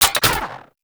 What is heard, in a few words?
spear gun mech; Warframe; Weapons; Corpus